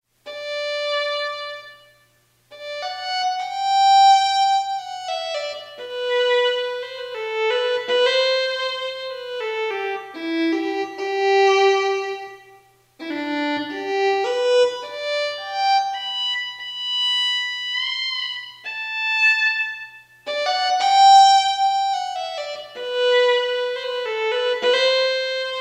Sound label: violin